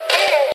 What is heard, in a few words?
Raptor; Jurassic Park